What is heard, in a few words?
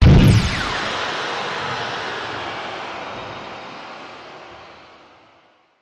Course Boom Sweep